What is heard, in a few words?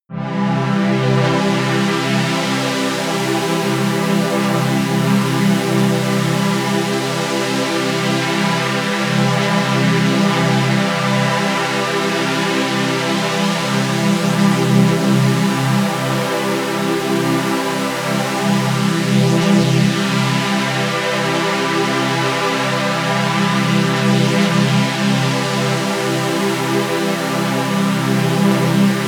running energy, music elements